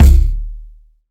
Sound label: thick kick sound D# key, Royality free kick one shot tuned to the D# note; kick drum